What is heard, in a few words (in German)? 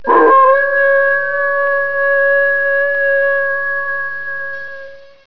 Wolf, Wolfs heulen und Knurren